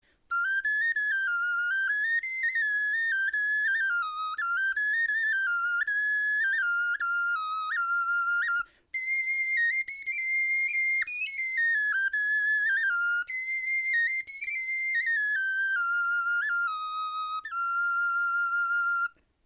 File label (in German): melodie, knochenfloete